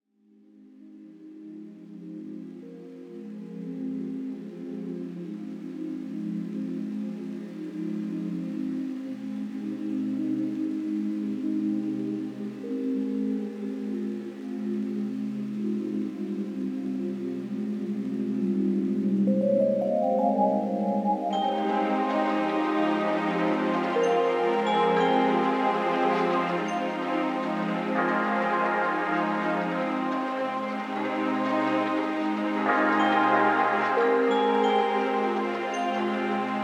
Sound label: After Hour Lounge Pads